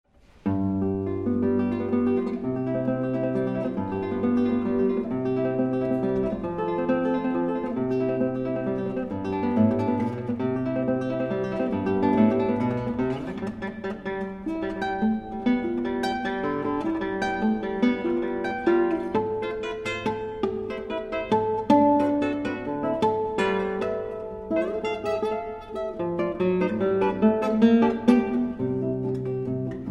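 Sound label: guitar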